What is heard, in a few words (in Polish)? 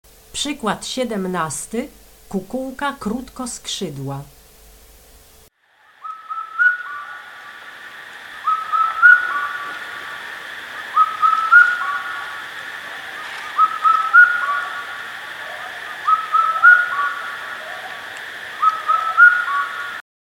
kukułka krótkoskrzydła, ptaki